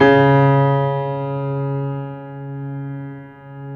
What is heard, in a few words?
PED FF C, GRAND PED; Best Service Advanced Media Trax Modern Composer